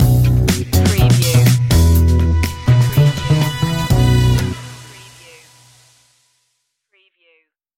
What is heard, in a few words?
tele fusion stinger